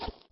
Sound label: scratch small